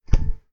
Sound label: Swish